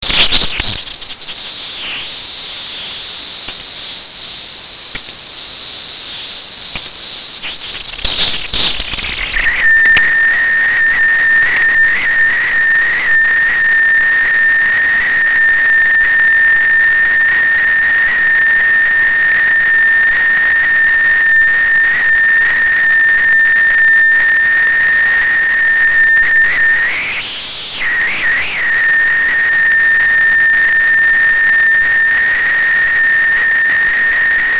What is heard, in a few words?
ultrasound twitter tone in air; ultrasound Michael onto twitter tone in air